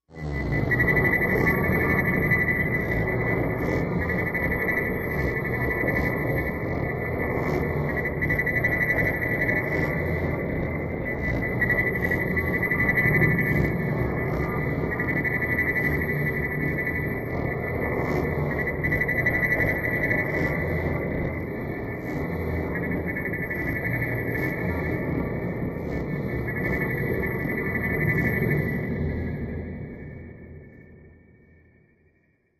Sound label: Eternal Inverter Mach, ambience